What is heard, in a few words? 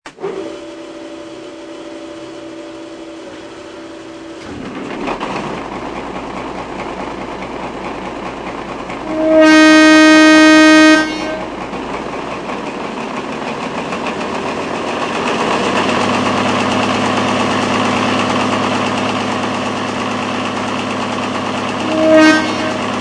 Diesel VT11.5 Lyntog; H0